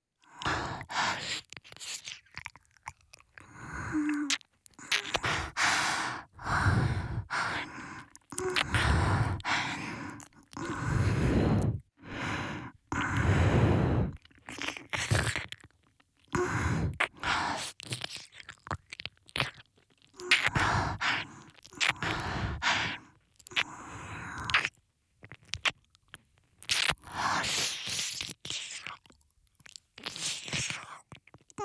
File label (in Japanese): 耳舐め（右）舔耳